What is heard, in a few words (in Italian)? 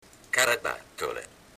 carabattole